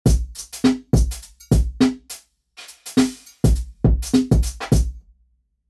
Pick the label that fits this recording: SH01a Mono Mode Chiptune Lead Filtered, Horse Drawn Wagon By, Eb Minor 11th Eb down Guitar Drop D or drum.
drum